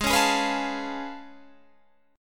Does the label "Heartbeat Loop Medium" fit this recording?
No